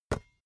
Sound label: basketball catch